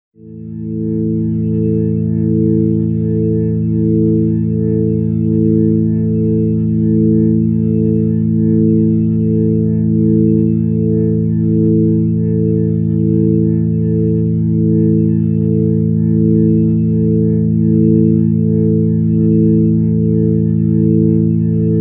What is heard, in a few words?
organ chord neutral a